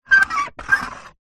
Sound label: foley metal sharp metal object scrape on metal panel surface